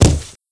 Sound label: Body Impact; Physics